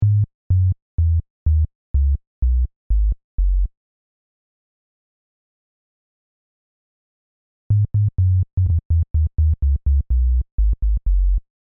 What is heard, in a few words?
Plod Bass